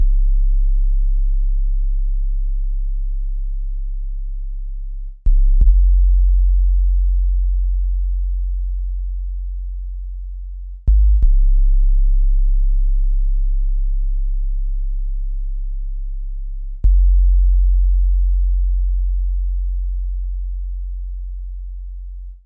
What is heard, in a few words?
171 supersubs bassline; MELODIC LOOPS